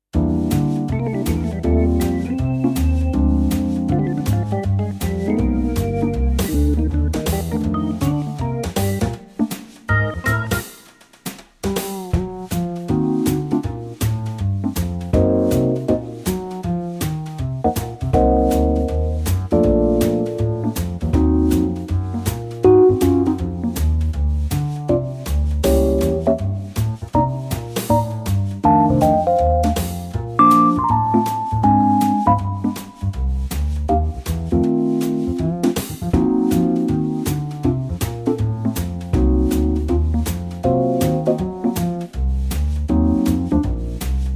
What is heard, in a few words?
Bb No Sax